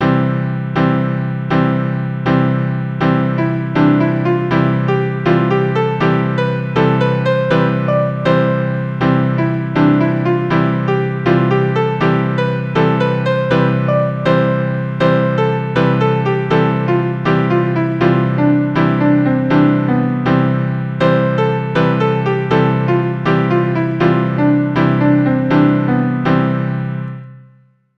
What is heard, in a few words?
Triplets vs Eighths